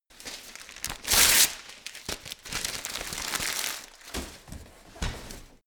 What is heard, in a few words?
paper rip and rustle